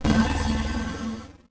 Transition Digital Scanner; Adobe; Production Element

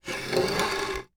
Wood Chair Slide Across Wood Floor, Household

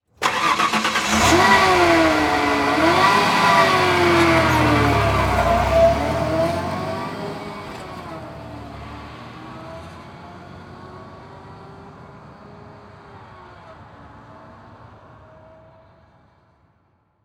Truck Ford Ranger Start And Drive Off Slowly; transportation

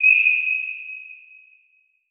Transition Musical Tone Medium; Production Element

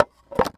Foley Wood Set Down On Concrete

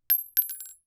Weapon Bullet 3030 Rifle Shell Drop; AdobeCC

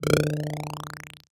Multimedia Internet CD-Rom Flash Click Multiple

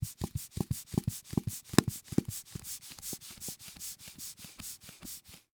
Sphygmomanometer Pumping Fast; Emergency Effects; AdobeCC

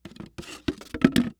Impact Cups Fumble And Drop Paper; Impacts

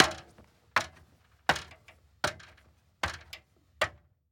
Industry Ladder Aluminum Ladder Climb Short

